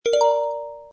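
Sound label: Camcorder Start; LG GT350i Wink Plus; System sounds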